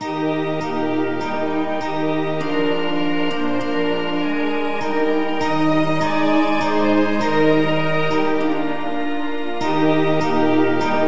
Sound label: polyinstru; hymns; Himnario grande